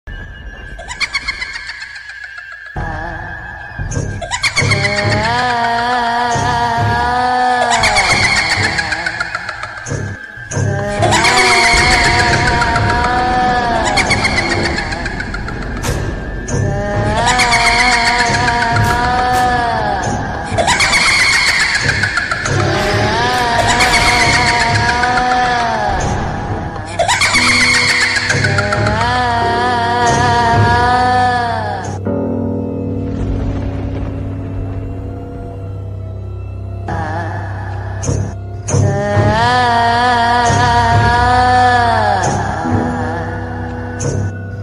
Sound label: horror ringtone; kanchana; bhitia ringtone